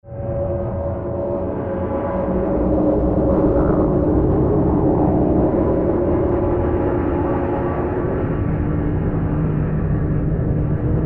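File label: metal Reverb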